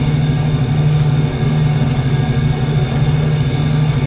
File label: random symbol transform loop; fairyred